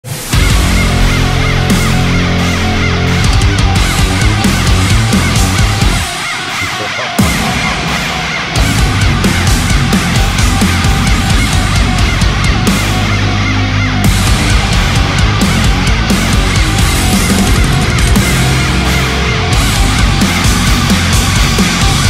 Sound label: instrumental version